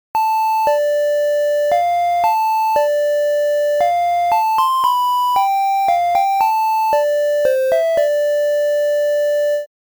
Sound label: Ocarina “”, 8bit; 8bit of Zelda -Ocarina of Time